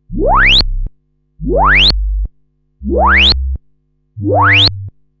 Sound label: Reso full Model 15; SIGNAL FLOW PART 2 EDIT